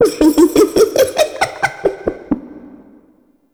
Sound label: Boss Vocal Laugh; Enemies; Vocals; Warframe; Oraxia